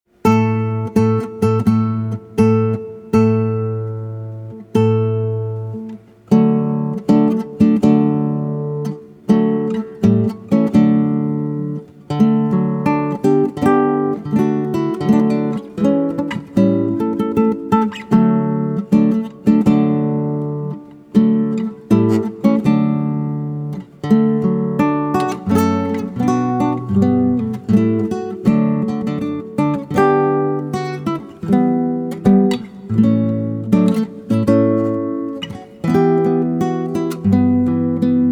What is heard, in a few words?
Bridal Chorus